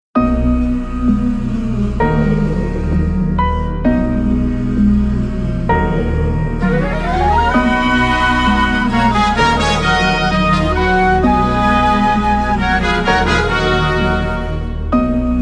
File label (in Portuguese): Atmosfera Hungria Hip Hop